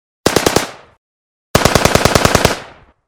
zvuk vystrela

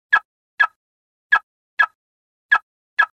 zvuk signalizacii mashiny

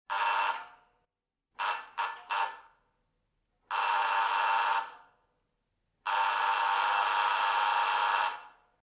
zvuk zvonka

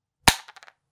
zvuk broska

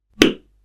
knigi zvuk

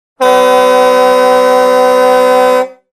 zvuk signala mashiny

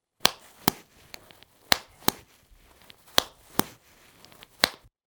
zvuk zaklepki

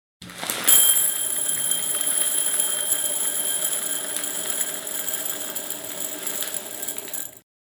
zvuk peresypanija

